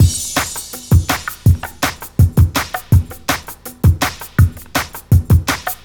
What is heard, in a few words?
Control Break, 9A, Jungle Breaks; Blu Mar Ten - Jungle Jungle - 1989 to 1999 Samplepack